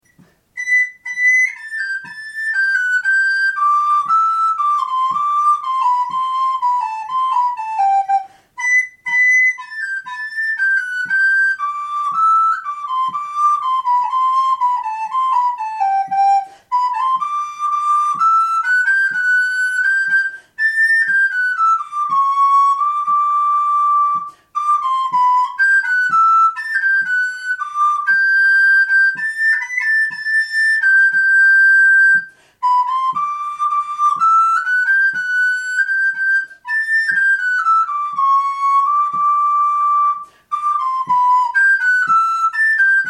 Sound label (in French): bourree, FLUTE